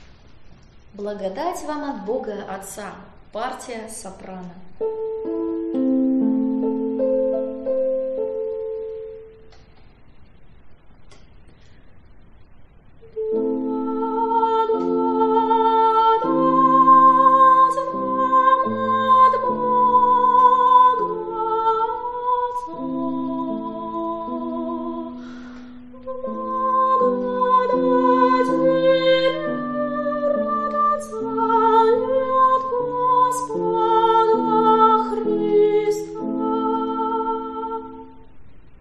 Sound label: soprano; blagodat vam ot boga otca